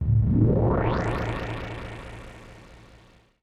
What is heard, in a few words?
KEY SHOT TEKNO 140 A; Techno2; Singleshots